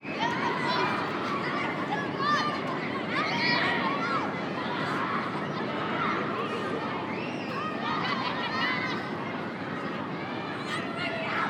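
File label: EXTERIOR AMBIENCE Children Playing in Park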